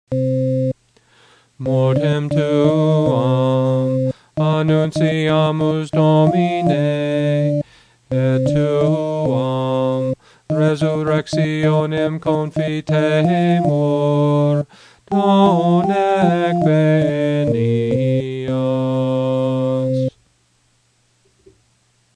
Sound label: Mass XV Acclamation Latin, chant; Mass Parts